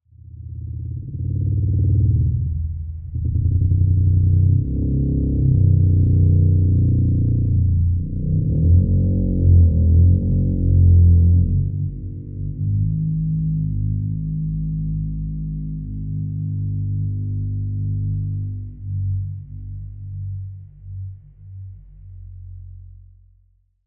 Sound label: Cinematic wood textures vibrations